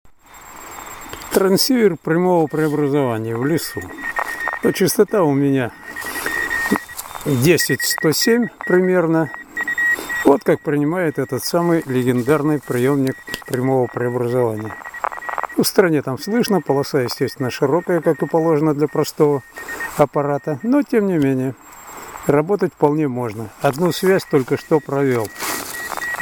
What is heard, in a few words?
DSB TRX